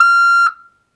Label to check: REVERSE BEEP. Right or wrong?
Right